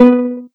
soft hit whistle; osu

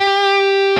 solo Left-hand vibrato

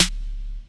snap; osu

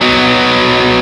x x 4 4 2 Bm; osu

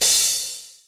Cymbal G

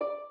Harp

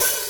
Hat Open

